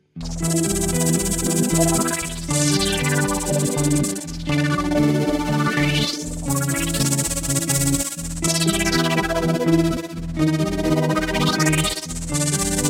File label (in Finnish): kitarasyna; guitarsynth